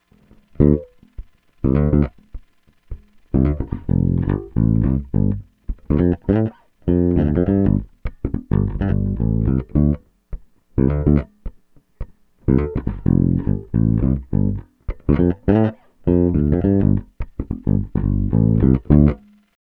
Bridge Fingerstyle Tone UP; Peavey based pickup Shootout; Super Neo

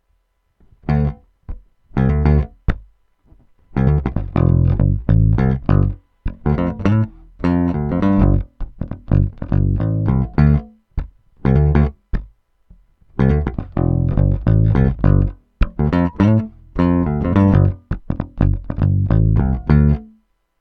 Both Pick Tone UP; peavey shootout audio samples

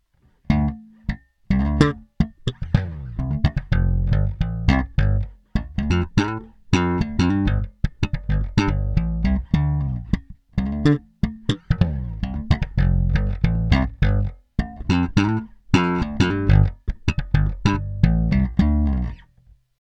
Slap Tone UP; Peavey based pickup Shootout; Super Neo

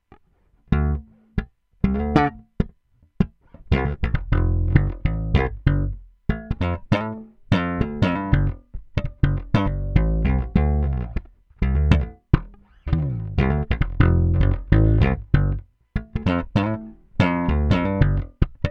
Neck Slap Tone UP, peavey; Peavey based pickup Shootout

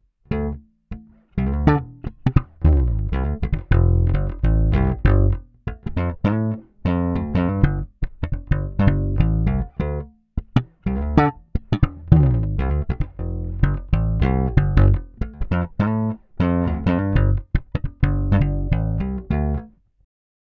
Humbucker Neck Slap Tone UP; Peavey based pickup Shootout

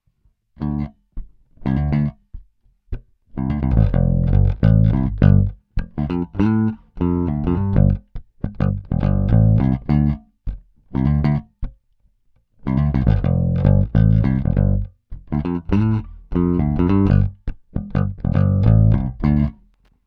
Humbucker Pick Tone UP; peavey shootout audio samples

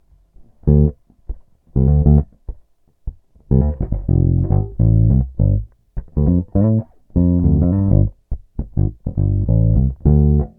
Super Neo Neck Fingerstyle Tone DOWN, pickups; Peavey based pickup Shootout

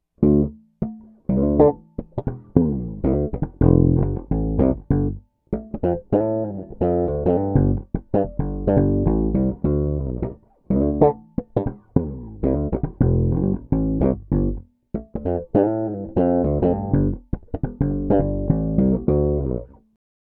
Humbucker Bridge Slap Tone DOWN; Peavey based pickup Shootout

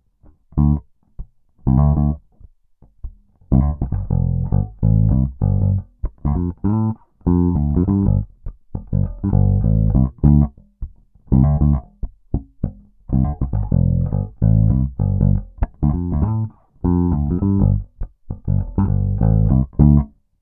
Peavey Stock Fingerstyle Tone DOWN; Peavey based pickup Shootout

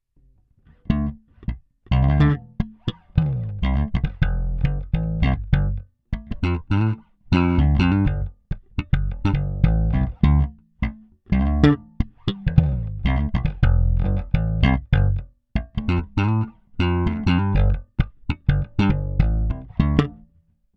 Peavey Stock Neck Slap Tone UP; peavey shootout audio samples

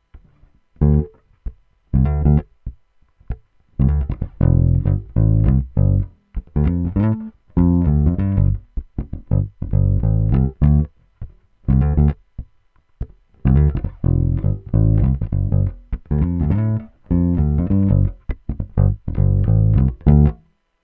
Neck Fingerstyle Tone UP; peavey shootout audio samples

